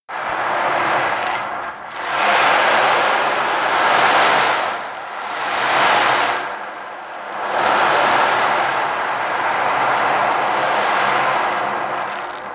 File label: ocean drum 40 cm, ocean drum 40 cm prirodni